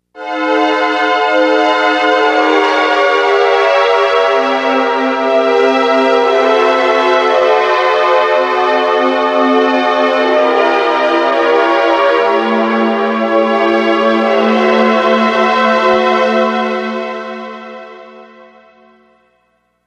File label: Progression, chord progressions